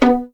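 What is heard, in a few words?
B3 pizzicato MF, violins; East West; The Ultimate String Collection; VLNS PIZZ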